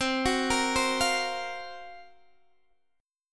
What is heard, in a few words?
F sharp Major 7th Flat 5th C